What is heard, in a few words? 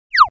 UI Notification Out; Skylanders Cloud Patrol; Arcade, iOS, Mobile